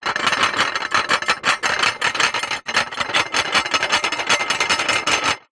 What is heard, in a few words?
household stack of plates wobble movement